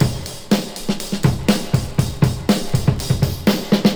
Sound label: 121 bpm drum groove b key